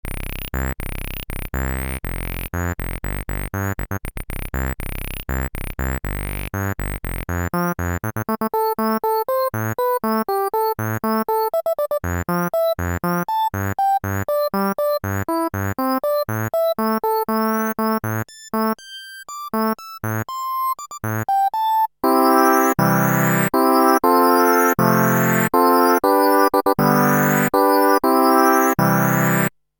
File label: filter, rez 12 pole; e-mu virtuoso 2000